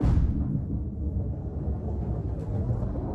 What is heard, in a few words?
bowling